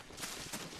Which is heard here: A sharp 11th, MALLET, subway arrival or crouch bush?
crouch bush